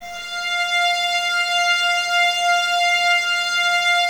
VS F 5, Violins; Miroslav Vitous; 11 Violins

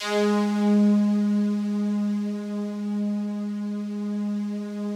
FOG ATTACK, Yamaha VP-1; Music Store; 90's Sample CDs

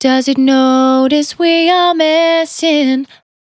Finally 70bpm C, Velvet Vocal; Zero-G

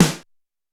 Roland U220 Dry Set; 90 s Sample CDs; 300 Drum Machines

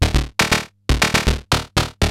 SH101 LOOPS; Syntec; Wall of Sounds; TECHNO-BOX

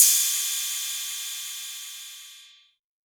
Cymbal, Novation Drumstation

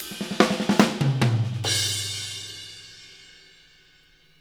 Fills 75; Zero-G; Monster Beats

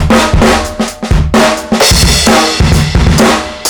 full, drums acoustic, 130bpm, metal drum; musicradar-metal-drum-samples

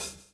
BLOW; Spectrasonics - Backbeat

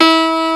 STENWAY; East West; Global Sounds

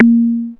A#, Synclav FM PERCUSSION; Big Fish Audio

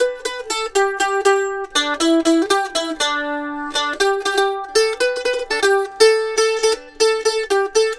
Mandolin Lead; Continental Drift Aftershocks

